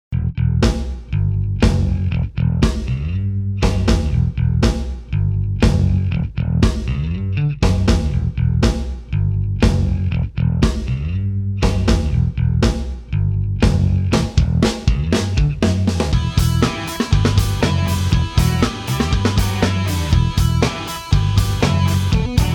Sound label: Pop Punk Instr